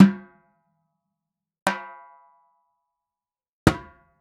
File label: Raw Perc Dhol